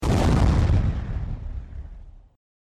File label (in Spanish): Estruendo, ruidos